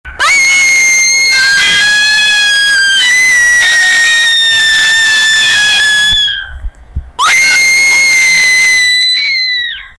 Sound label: kid crying louder and scr